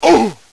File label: argh; FREERIDE